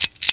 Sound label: Button